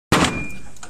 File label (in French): cassage de table